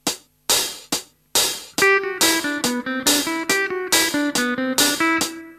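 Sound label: natural minor scale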